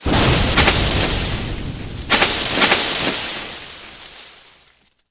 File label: break; SuperTuxKart